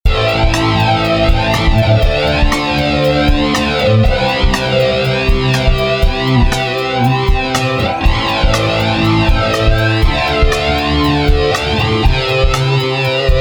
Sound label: mix flanger; FX Story le flanger